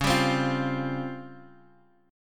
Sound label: C sharp Major 7th Flat 5th Guitar Half Step Down; C#M7b5